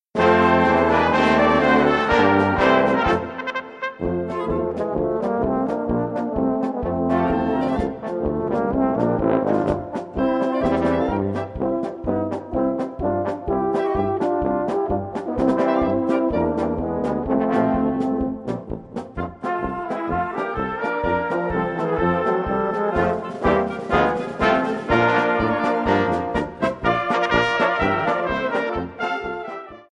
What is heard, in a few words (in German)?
polka